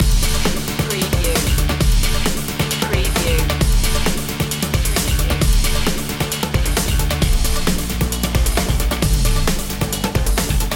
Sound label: plasma racer loop